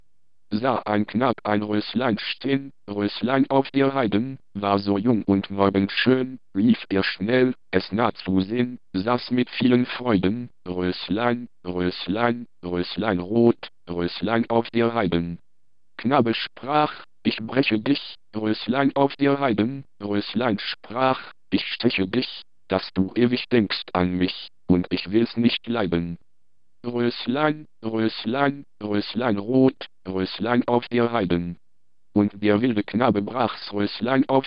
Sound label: synthesizer